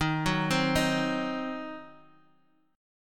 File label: Eb Minor 6th Eb down Guitar Standard; Ebm6 arpeggiated; JGuitar